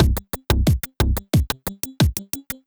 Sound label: Tension Loop